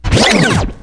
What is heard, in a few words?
spin back, Scratching and Turntablism